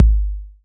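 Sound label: bass drum 808; Best Service Gigapack 2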